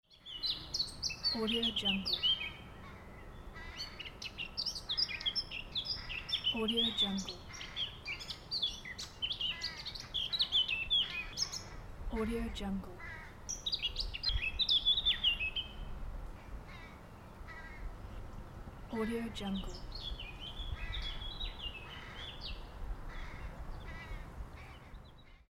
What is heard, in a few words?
birds